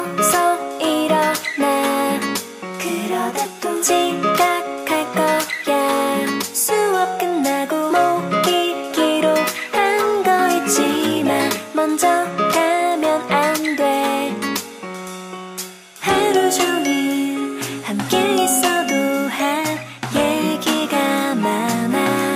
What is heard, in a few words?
Lg Ringtone Life Is Good Ringtone